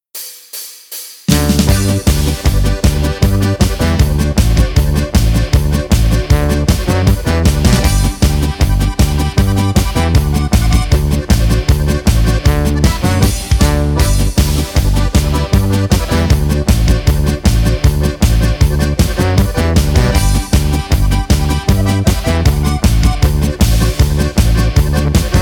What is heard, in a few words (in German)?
Polka